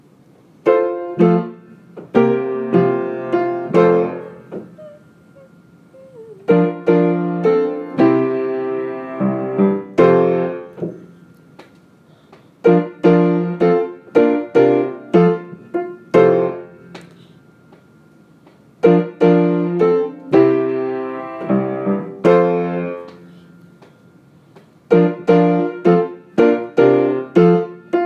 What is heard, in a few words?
solo accompaniment